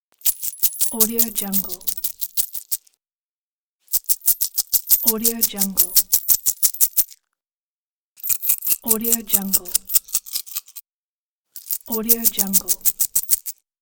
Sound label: coins shaking